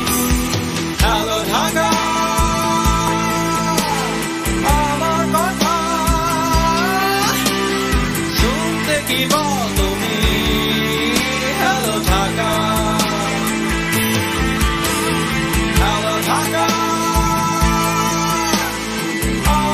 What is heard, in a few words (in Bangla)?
Bangla Song